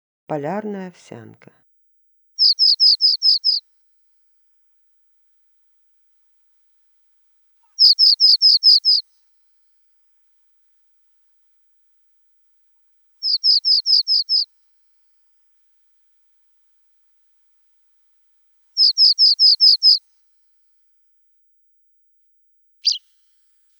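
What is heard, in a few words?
polyarnaya ovsyanka golos, Pallas's reed bunting voice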